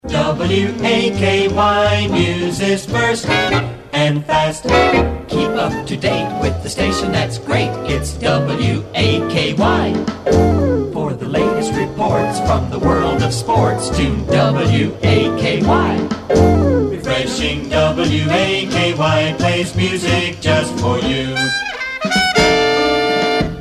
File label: Jingles; WAKY Futursonic Jingles